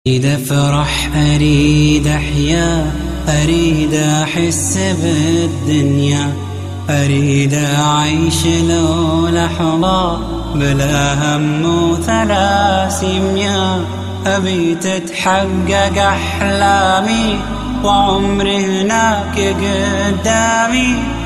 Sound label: Beautiful Nasheed